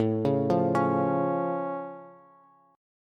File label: A Augmented A, Bass; chord